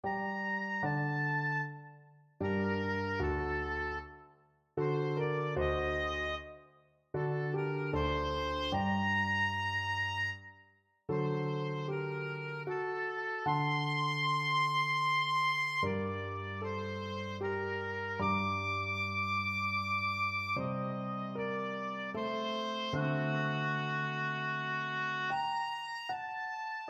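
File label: 1st movement four seasons, oboe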